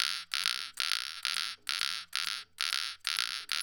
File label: SHELL, Ethno Techno; ILIO; BUZZHEAD 132